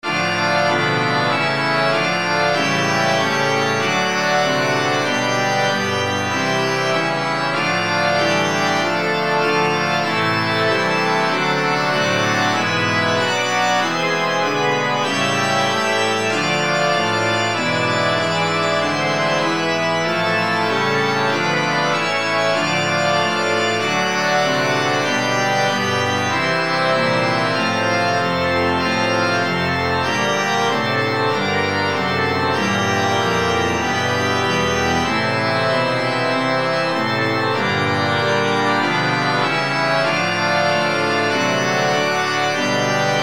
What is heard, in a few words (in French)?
plein chant du premier kyrie